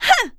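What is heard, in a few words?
Attack Voice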